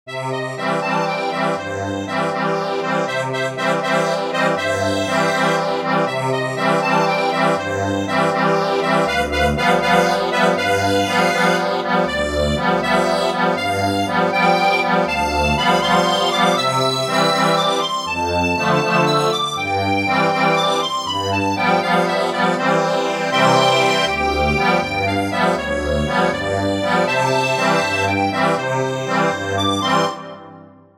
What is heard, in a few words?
ACCORDION